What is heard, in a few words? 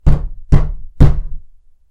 open the door; horror